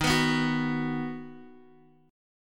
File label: D sharp Minor Sharp 5th D sharp, Guitar DADGAD; chordshape